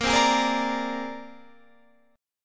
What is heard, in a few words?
C 7th Suspended 2nd Sharp 5th, Bb